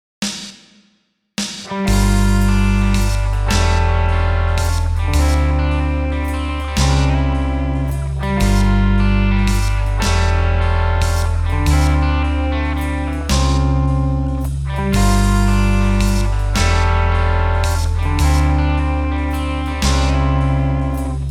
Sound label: rain blues